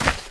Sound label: steps; the monster plane; kolmods